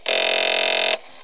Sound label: Grunt Grind; activegs ios